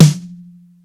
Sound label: SNARE, DRY; Crypton - DrumBase5000; DRY KITS